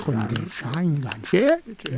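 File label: Music Village Calm Inside; From Dust; PS3; Xbox 360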